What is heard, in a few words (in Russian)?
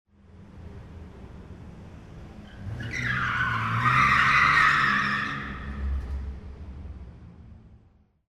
mashinu zanosit na povorotah v podzemnoj parkovke